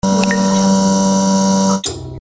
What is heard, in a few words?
work neon lamp